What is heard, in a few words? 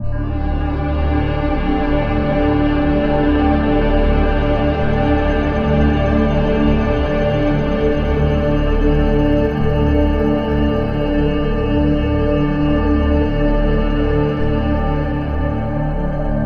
Transition Screen Intro Drone D; ascendance; Ava Music Group Ava Instinct Drone All Drones D